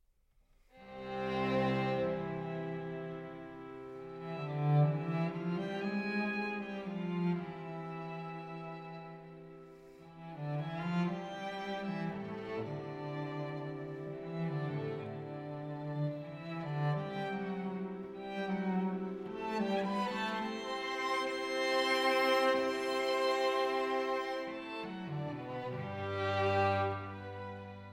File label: Quartet; Britten